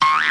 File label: Flower Boing; LBT Preschool; Harvest